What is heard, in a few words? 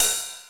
cymbal, Roland MC-909